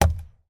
steering wheel tap person single

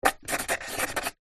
office pen felt tip sign signature on thin wood

